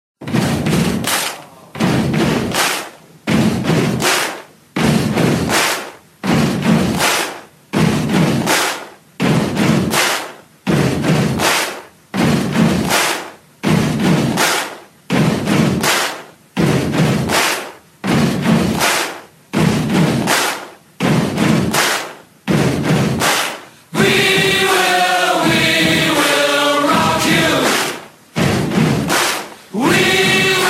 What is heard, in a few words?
instrumental